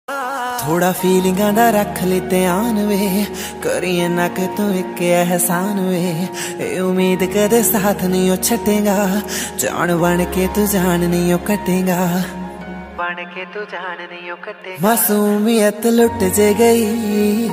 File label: panjabi ringtone